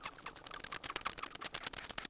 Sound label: bats; Cars; XBOX